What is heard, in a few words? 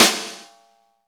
snare, Roland; Club-50 - Foundations Roland; Ext. Snare 4